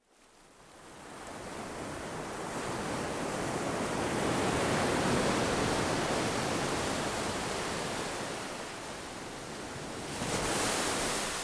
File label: Shore Amb Mediumsize, stores